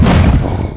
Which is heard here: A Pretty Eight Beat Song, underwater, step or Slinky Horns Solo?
step